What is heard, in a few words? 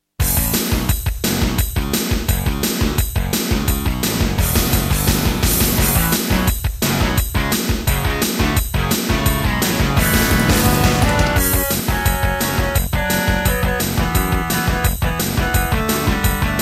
Intro, Terratec MiniWaveSystem SOWT-11; Duke Nukem 3D